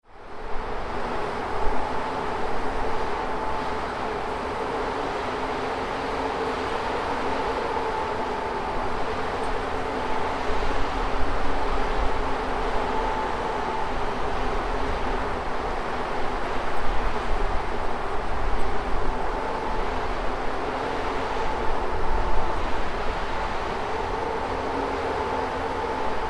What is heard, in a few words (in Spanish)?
viento